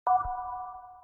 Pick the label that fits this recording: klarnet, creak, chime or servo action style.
chime